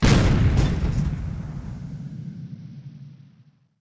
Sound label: Building Explodes; Harvest Massive Encounter